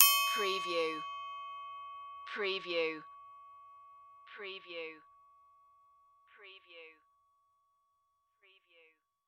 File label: Musical